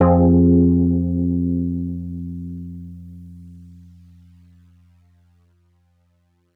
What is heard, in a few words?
stab loobits, stabs